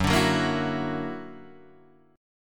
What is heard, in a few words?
Gb Augmented 7th Gb down Guitar Open D